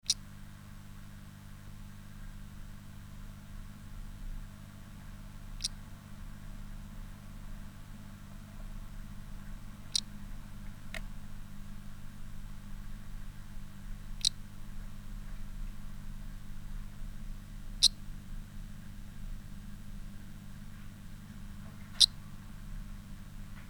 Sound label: Mantella aurantiaca; voice